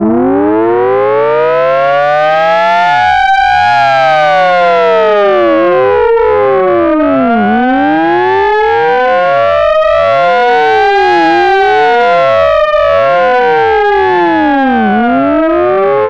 civil defense mechanical siren

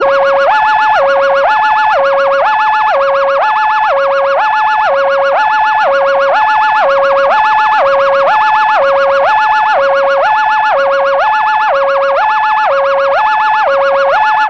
ambulance electronic siren

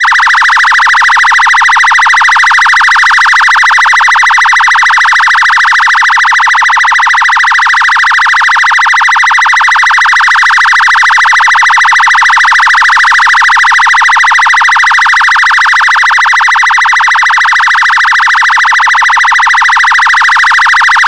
electronic phaser siren

